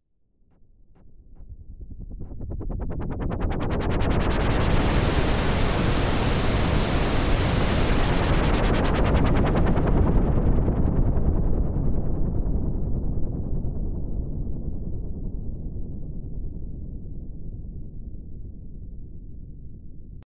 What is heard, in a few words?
Pink Noise Effix Delayed; Techno